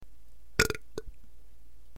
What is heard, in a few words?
small burp